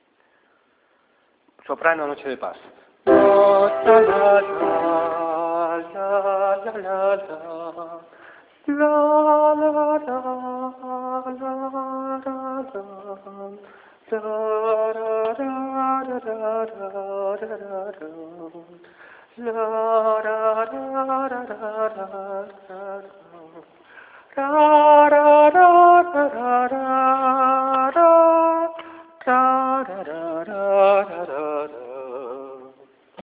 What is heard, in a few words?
soprano